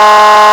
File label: horn, sirens; whelen cencom sapphire